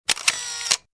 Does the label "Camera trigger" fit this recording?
Yes